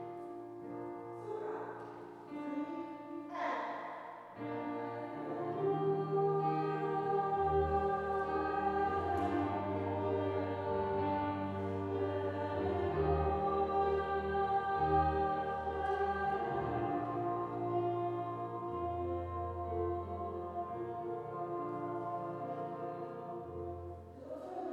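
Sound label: Hallelujah soprano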